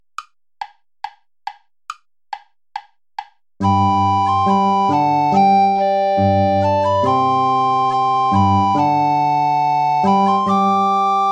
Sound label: ballet play along without tenor recorder; praetorius michael